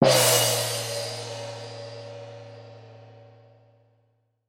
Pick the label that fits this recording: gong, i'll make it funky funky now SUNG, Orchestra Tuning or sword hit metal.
gong